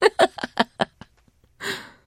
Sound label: trader laugh; 商人杰恩（七日杀）